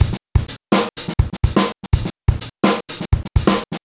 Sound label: fx 125, Beat Loops; Carnage; Zero-G - Monster Beats